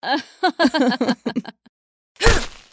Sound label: Mage Win; Goddess of Trampling